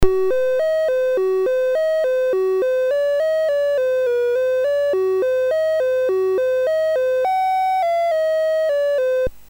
BASIC sid MOS6581R3; Cocoduel

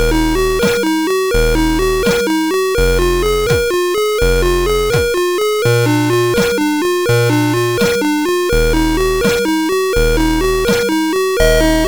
tune, sid, MOS6581R4

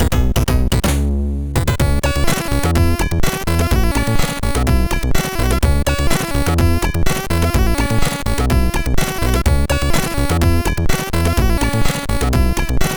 Doofee, sid MOS6581R2

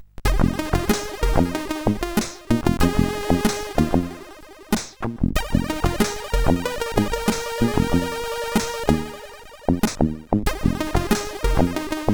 G Funk, CSG8580R5

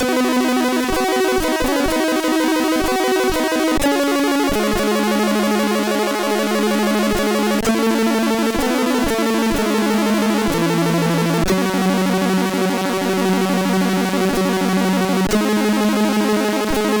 sid CSG8580; HVSC

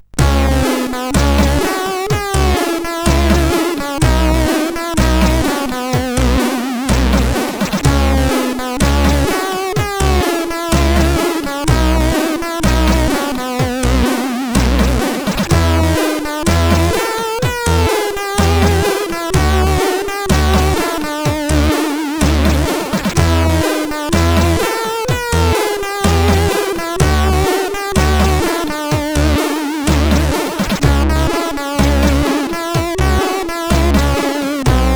Reggae sid CSG8580R5

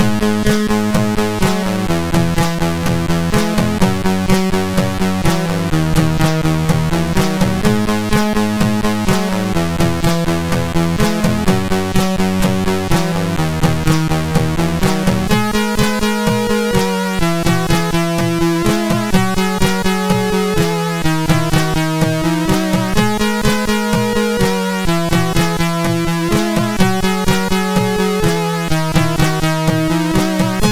Intro, sid MOS6581; Afros

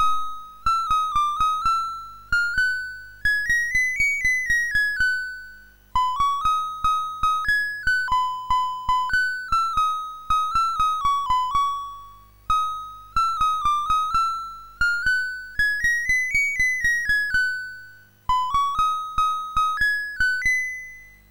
MOS6581R3; sid

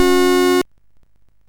War Cars Construction Kit, MOS6581R3

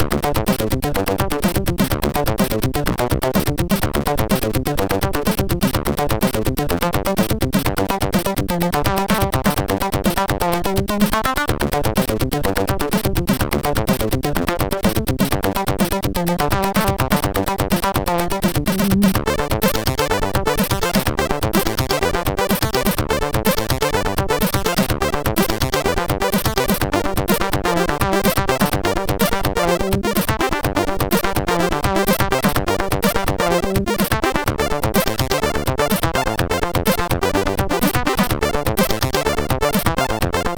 2-Voice Level, sid MOS6581R4

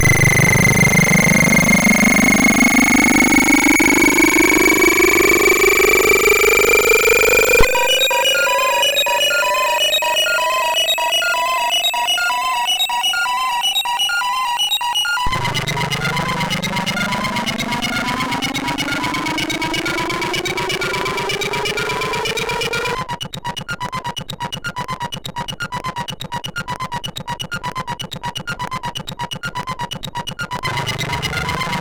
Melanchonical, MOS6581R3

